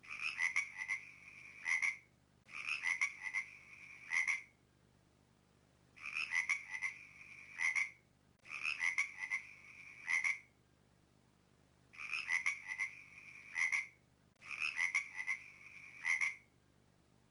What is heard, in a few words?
nokia 1200 ringtone; bullfrog